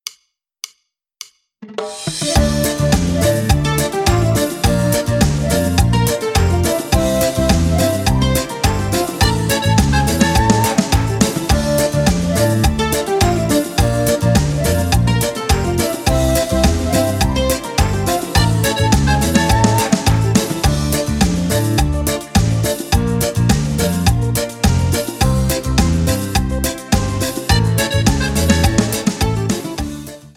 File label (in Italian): STOCCUMBIA base, cumbia